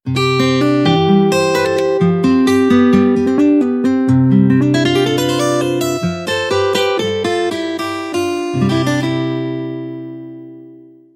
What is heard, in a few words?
guitar, ringtones; Tecno Pop 5